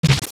Kill; Kill an enemy

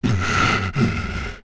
Breathe; Ganondorf

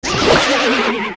Leever Die

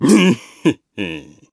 Laugh Proud; laughing, proud of his work; Groose; Skyward Sword

